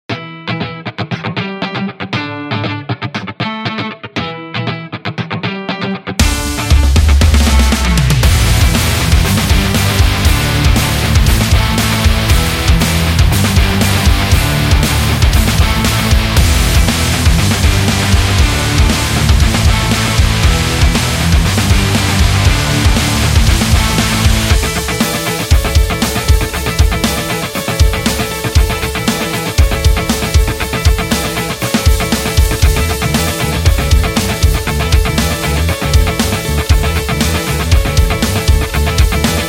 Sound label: Grunge